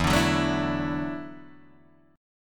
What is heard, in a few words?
E 7th Suspended 2nd Suspended 4th, Guitar, DADGAD